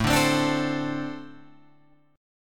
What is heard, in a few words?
A-Minor Double Flat 5th A Guitar Open G